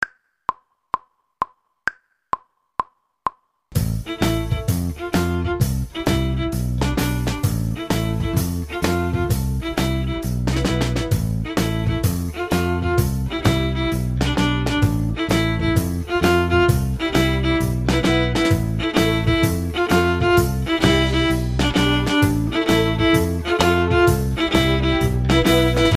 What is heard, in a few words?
violin section